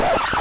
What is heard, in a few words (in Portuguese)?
disco arranhado efeito sonoro